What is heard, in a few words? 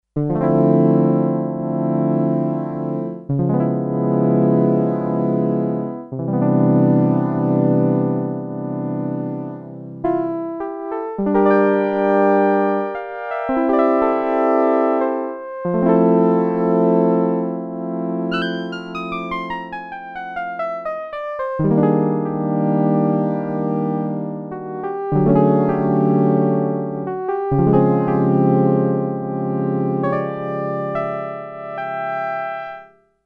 Electric Piano, Sequential Circuits Six-Trak; Factory Programs